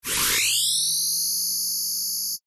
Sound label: science fiction power up spark; zapsplat